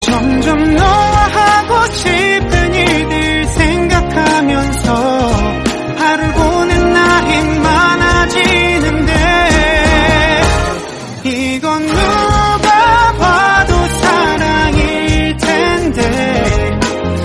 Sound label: instrumental song